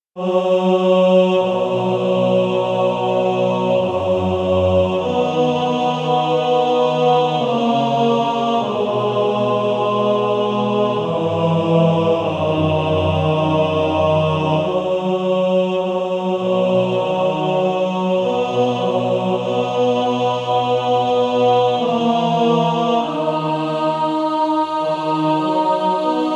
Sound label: Male Choir; 2nd Tenor